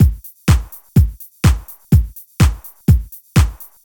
DEEPHOUSE BEATS 125

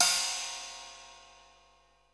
SPLASH, CYMBAL; drum

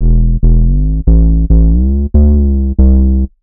ELECTRO BASS 140 A

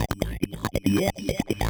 TECHNO SYNTH 140; Keys

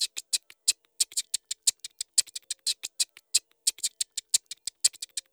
ETHNO EXTRA 90; ethno2

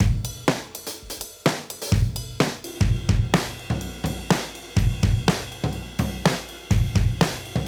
Modern Breaks; Touch Loops; ClassicBreakSessions

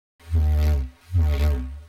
ethno, 125

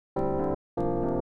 HOUSE EXTRA, 125

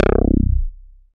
BASS MONO

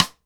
dry rim, acoustic drum

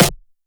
clap snare; Anthemic

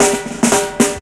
fill 112, drum; BREAKS

